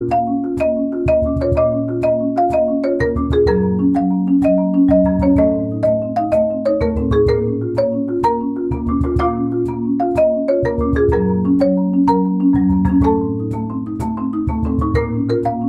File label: Marimba